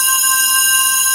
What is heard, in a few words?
Metal Wave C5; Proteus 2000; Digital Sound Factory Proteus 2000